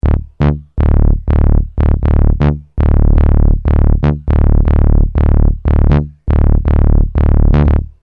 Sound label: Bass; Industrial